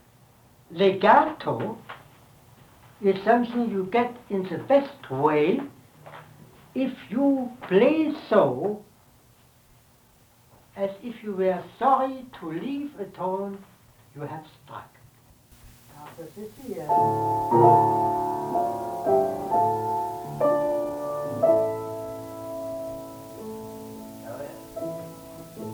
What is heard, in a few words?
legato